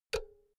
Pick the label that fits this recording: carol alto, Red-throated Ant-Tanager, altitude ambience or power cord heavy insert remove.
power cord heavy insert remove